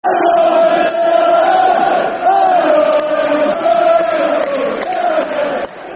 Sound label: Rovers Till I Die, Football Chant (Soccer Song); Doncaster Rovers Drfc